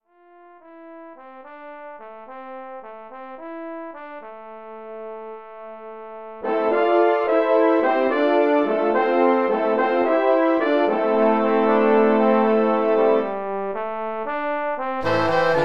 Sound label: Wind Ensemble